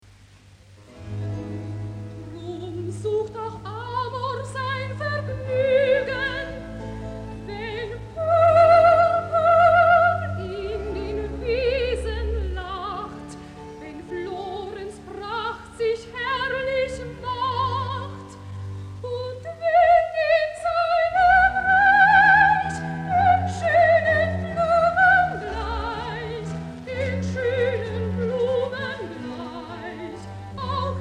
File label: Recitativo